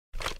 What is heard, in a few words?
m4a1 put away; emx rivet